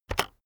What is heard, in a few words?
keyboard external enter press very fast